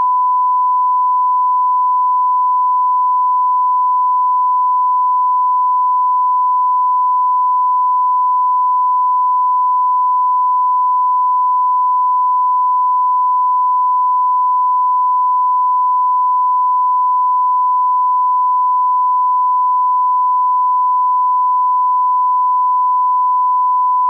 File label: Sine 1000Hz -10dB; test tones